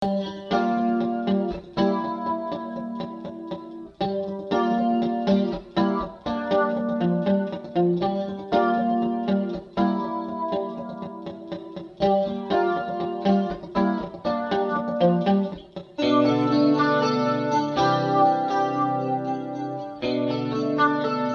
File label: Korg A1 Guitars Para Flange